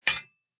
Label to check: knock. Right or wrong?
Right